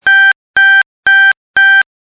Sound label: Fixed Low Seven Plus; dexcom g4 platinum